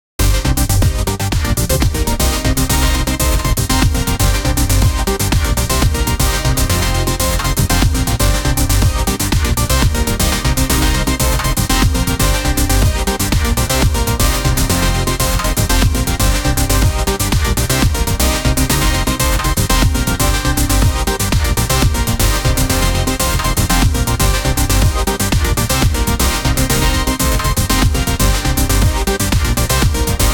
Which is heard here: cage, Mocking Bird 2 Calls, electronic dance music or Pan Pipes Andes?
electronic dance music